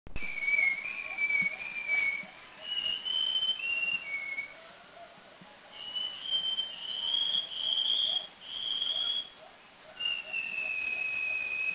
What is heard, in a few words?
Black Shama Tabunan; Cebu; Philippines; endangered Black Shama on Cebu